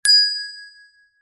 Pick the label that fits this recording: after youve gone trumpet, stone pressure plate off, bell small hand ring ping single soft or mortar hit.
bell small hand ring ping single soft